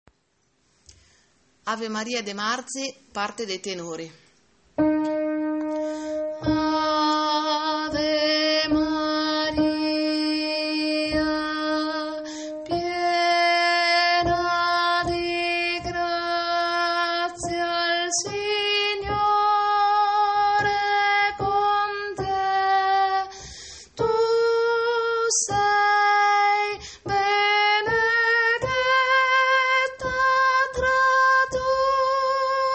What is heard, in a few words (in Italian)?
ave maria tenori